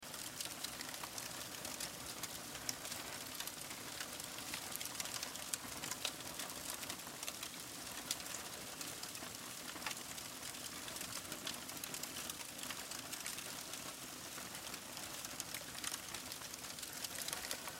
Fire Paper, Fire Paper Newspaper Flame Flicker Loop; Sizzle Crackle; Flame Sound Effects